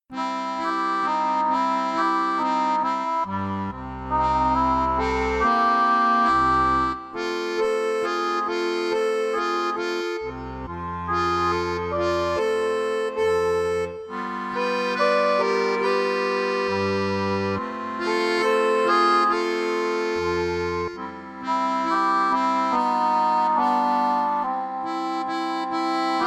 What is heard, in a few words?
Little Blues Duet